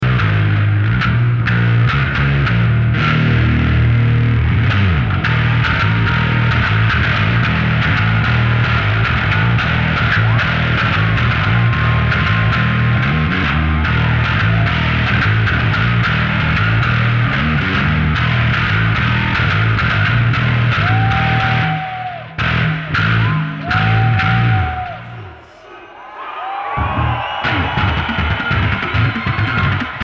Bass Solo

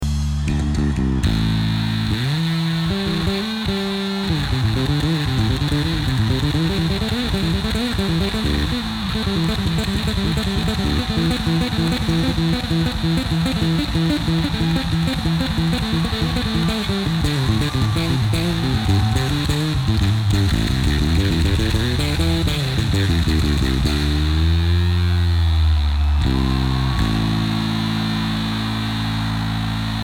Bass Solo

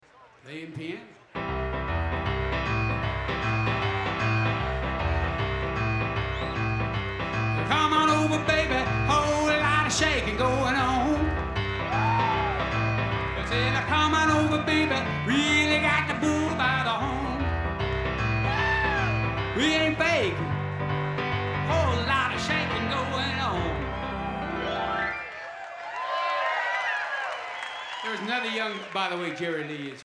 Piano Moment

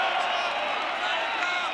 Bass Solo

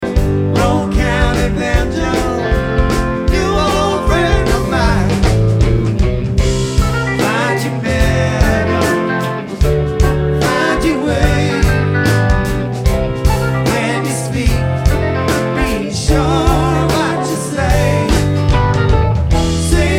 Banjo

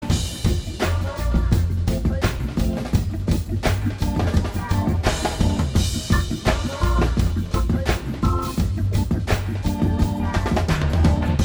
Drum N Bass Remix

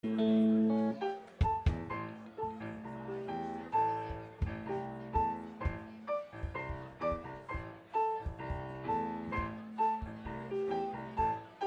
tuning intro

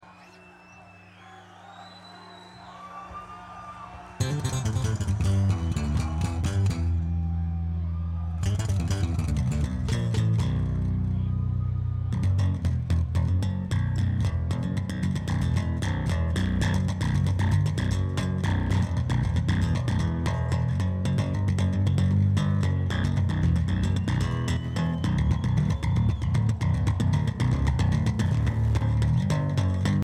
Bass Solo

